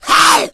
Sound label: strike smoker; umbrella